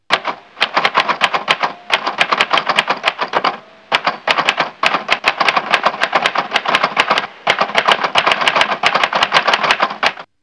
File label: typing